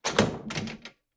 Lock Metal

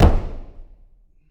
Boxing Ring Bodyfall

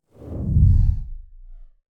Whoosh Short And Deep Sci-Fi; Hollywood Edge; sound designer's toolkit v2

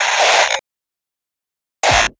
Electric Knockout; Plastic Surgery

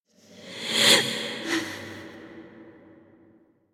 Female Breath Reversed Double Eerie Panic; Hollywood Edge; sound designer's toolkit v2

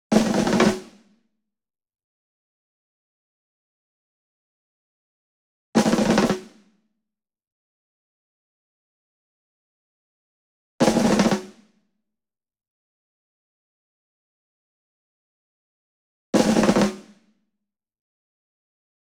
ENSEMBLE SNARE Group Roll Near; boom library; Cinematic Strikes Construction Kit

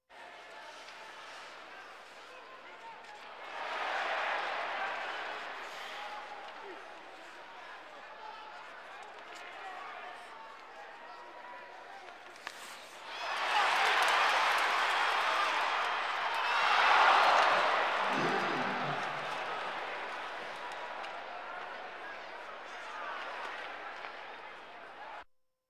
Sports crowds

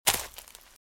Footsteps Leaves Walk Left; RadwinIsland

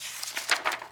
Book Page Turn; SFX Kit; Foley - Misc

